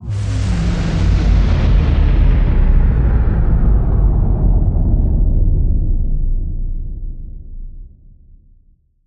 Energy Core Power Down

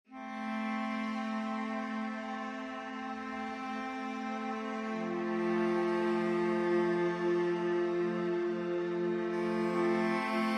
Woodwind

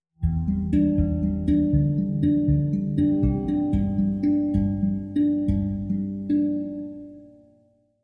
Fairy Tale Sting

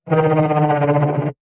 Wolverine Growl

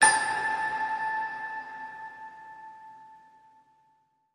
Christmas Ping Mid

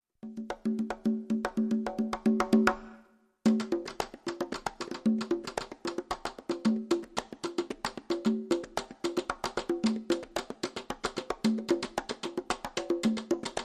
African 6 8 Loop

